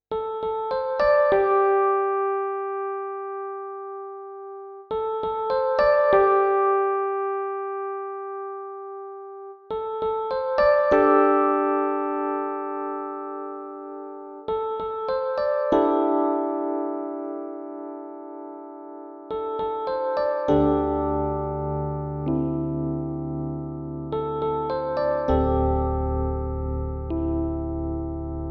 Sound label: E Piano